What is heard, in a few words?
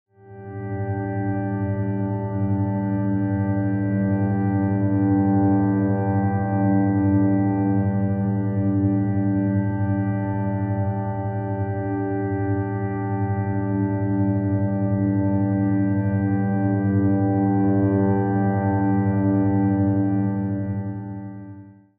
frozen reverb drones stereo